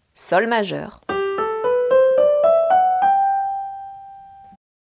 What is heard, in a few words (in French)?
gamme sol majeur